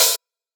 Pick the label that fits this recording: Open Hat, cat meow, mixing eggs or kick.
Open Hat